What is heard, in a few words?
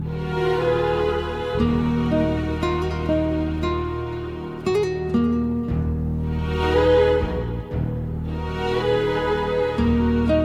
nice alarm tone; ringtones